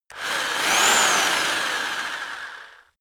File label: rock drill rev reverse double; maschinen; bohrer